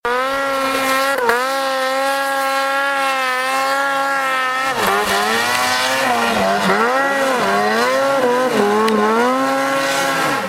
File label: Snowmobile